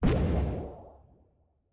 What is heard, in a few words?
Head Explode; Silence Incarnate